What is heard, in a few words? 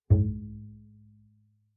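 Bass pizzicato fortissimo sul E A2, Double Bass; MIS Pitches - 2014; Strings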